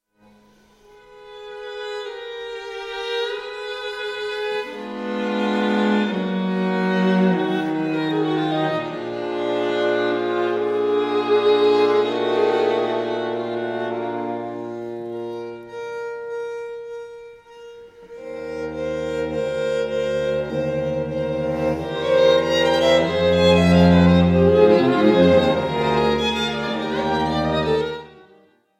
string quartet in b flat; doric string quartet; op 76 no 4

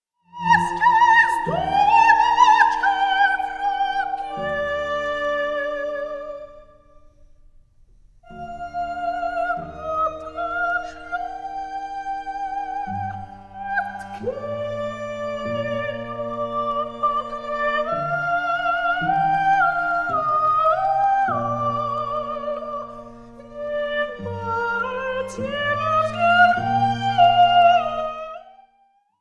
settings for soprano and quartet; vanburgh quartet; akhmatova settings

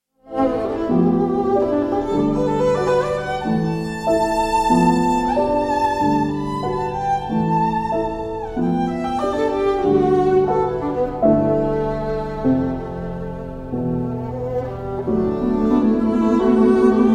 violin and piano arabesque; arthur benjamin; ian munro; 3 pieces for violin and piano 1919; carnavalesque

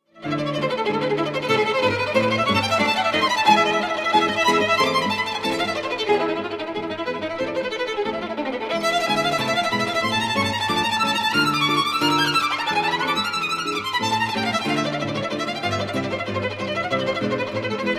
moto perpetuo op 11 in c major vers for violin gu

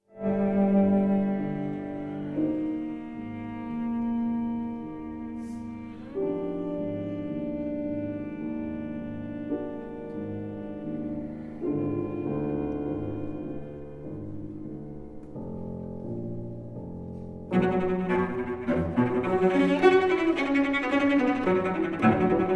sonata in d minor for cello and piano; claude debussy

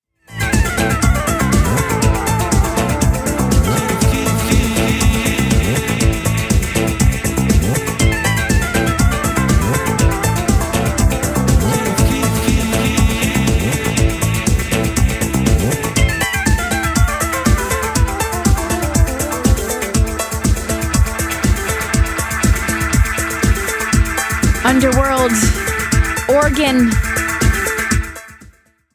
organ

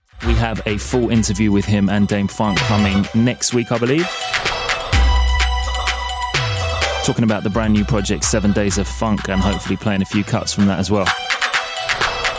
harp bell

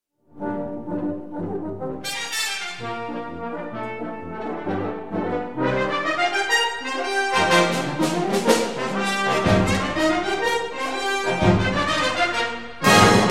for brass band vivace; sir william walton; first shoot arr for brass band no 5

